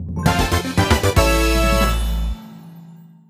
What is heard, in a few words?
Vehicle Part Unlocked; Mario Kart 8